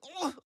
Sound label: Land